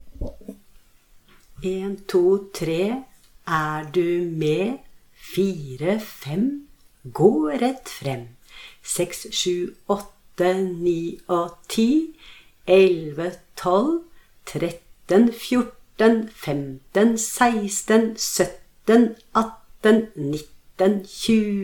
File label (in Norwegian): grunntallsang; mottaksboka; sanger til mottaksboka